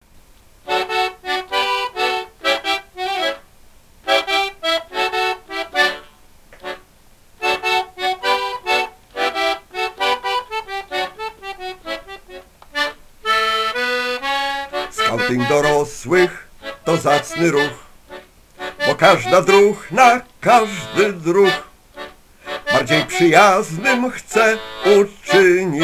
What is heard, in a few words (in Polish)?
Hymn, solo; ISGF